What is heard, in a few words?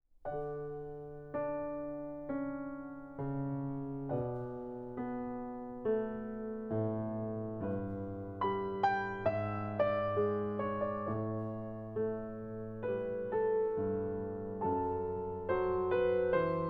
Air on a G String